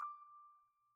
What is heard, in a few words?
glass D5 Fast Decay, Wine Glasses; Idiophones; Friction Idiophones; Releases